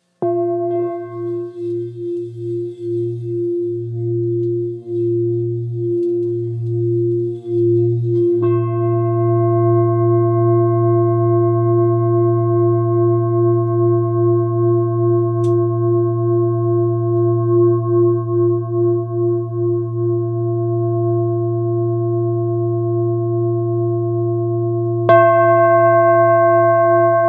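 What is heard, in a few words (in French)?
Bol thick fa, bols chantants tibétains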